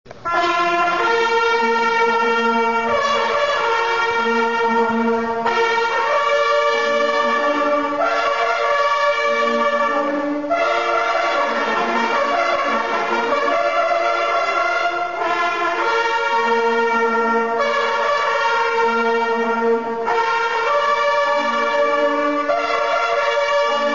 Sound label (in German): Festfanfare